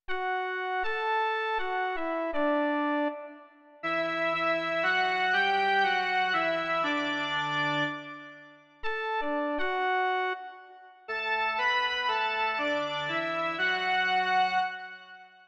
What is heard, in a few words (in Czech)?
jen melodie, Doprovody liturgie; Slovo Boží; Doprovody Farského liturgie